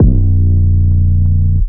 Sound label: Bass